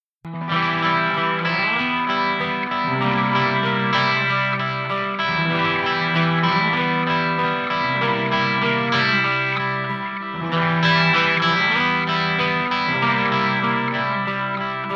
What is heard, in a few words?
Noise of thunder lead guitar excerpt